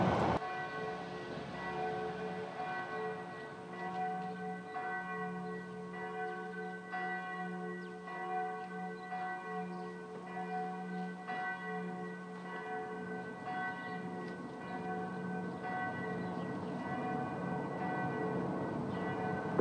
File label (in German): Glocken am Gründonnerstag